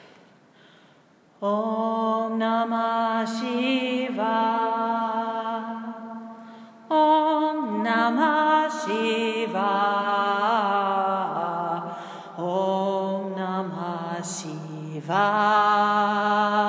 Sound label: Om Namaha Shiva